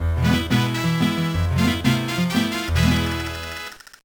Jingle, gba; Backyard Baseball 2006

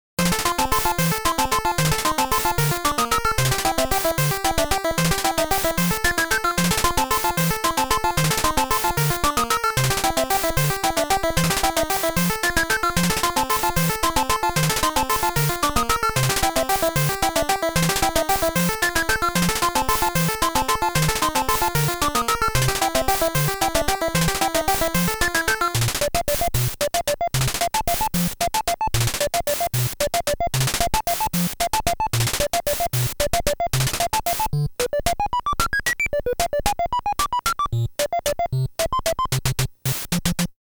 Bonus Stage; Musical Instrument 1~5

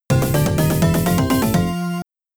Underworld Level Complete; brian the lion; amiga

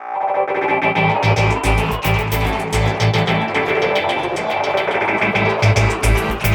Disco TransitionFX

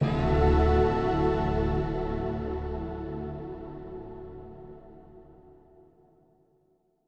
Stingers sting gain; Hand of Fate 2

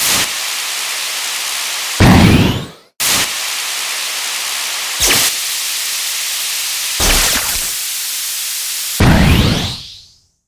Drifting; Mario Kart 8

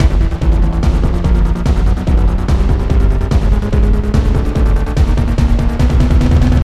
Stinger; Melting Chamber; Lego Movie Videogame

